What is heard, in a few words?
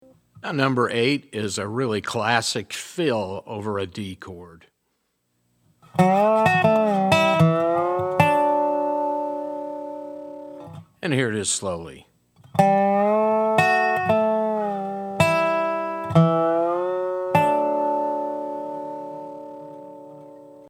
resonator pedal, steel guitar